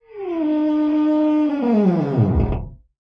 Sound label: screech, squeaks